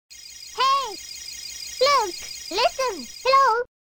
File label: Ocarina; Navi